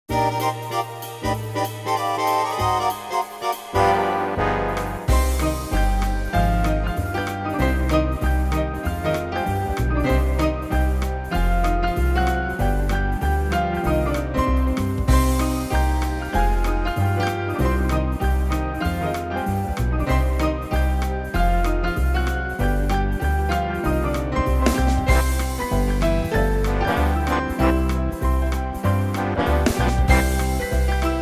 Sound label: QUICKSTEP